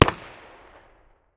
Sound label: pistol 45 medium distance; 45acp; tacz-tweak-pack; taczmechanics